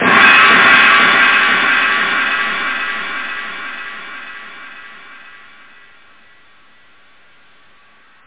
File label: cymbal